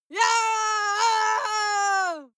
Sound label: female lunatic scream attack long; menschen; schreie